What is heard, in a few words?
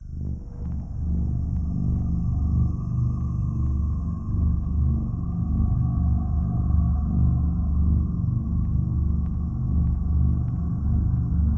Scary Fog